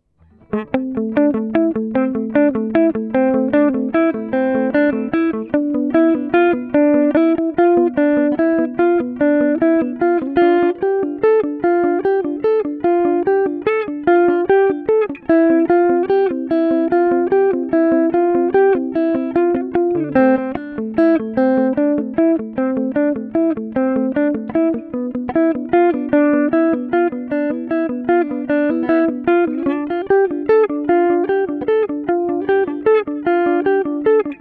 MultiTap

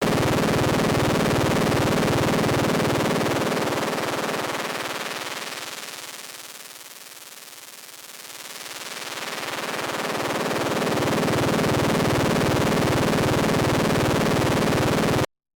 Behringer K2 LFO Max HP Sweep

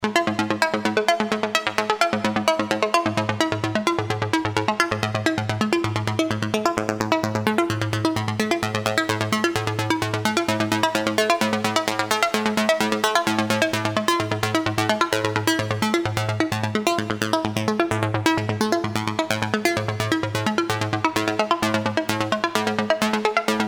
Filter CV

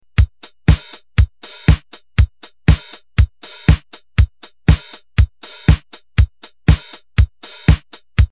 hard BD

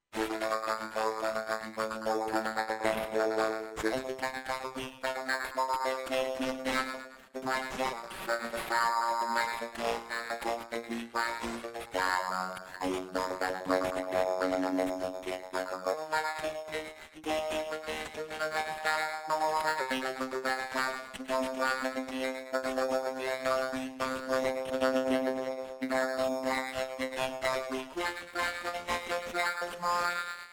xl voc

